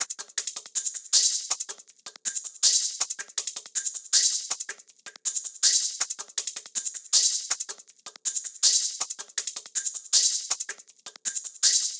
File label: Top Loop 80 Fast Shakers; Drums & Percs; Percussion Loops; Ghosthack